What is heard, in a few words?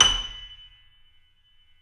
KEY, YC7 Piano ff